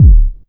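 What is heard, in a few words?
125 drum 2 R, Fresh Disco House; USB Soundscan vol.20; AKAI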